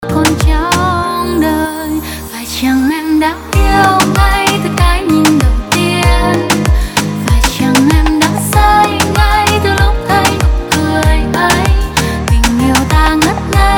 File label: moombahton